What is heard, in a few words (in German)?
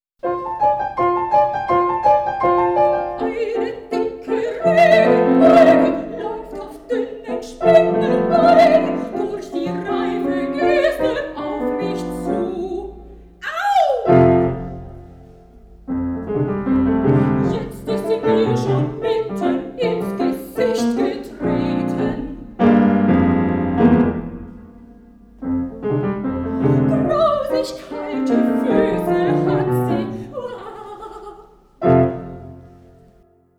Lieder - Sopran, Klavier; Lieder Auswahl